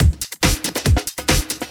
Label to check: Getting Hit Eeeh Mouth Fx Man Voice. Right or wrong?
Wrong